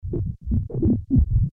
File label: additional deep bubbling